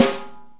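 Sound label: snare, Real Kit; REAL LIVE KITS; Multisampled Real Kit BJ-HD9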